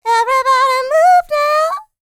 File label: licks, vocal, dance; ILIO; Vocal Planet